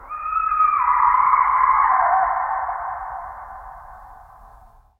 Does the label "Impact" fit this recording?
No